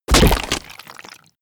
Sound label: club impact crack wet